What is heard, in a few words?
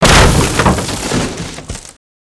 Platform Fall End; ninjago